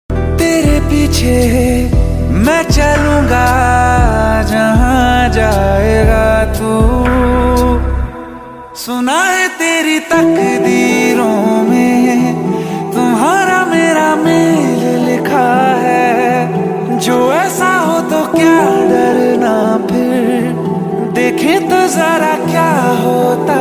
new ringtone

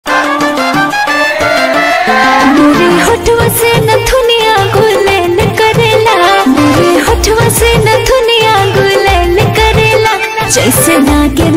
Bhojpuri ringtone

